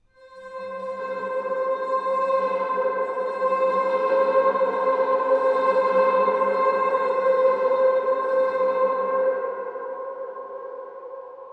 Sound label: PAD (C); One Shots; Complicated; Cymatics; Mystery Pack Vol 4